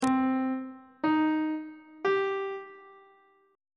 c harmonic minor chord 1 is c minor on treble clef